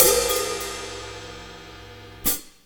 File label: HAT; E-MU Formula 4000 Series Vol. 1; Hip Hop Nation; Bonus Perk